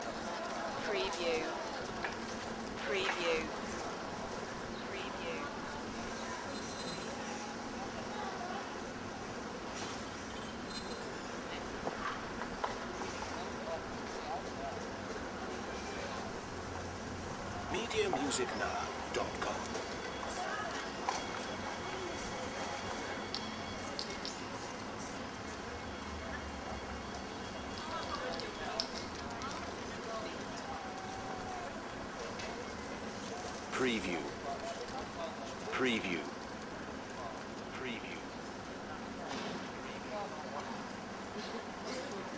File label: small town sound